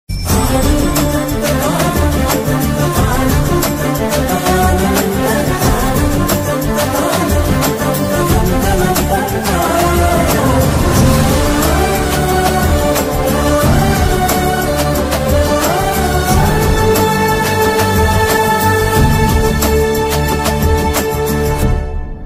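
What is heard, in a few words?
love background music ringtone; sita ramam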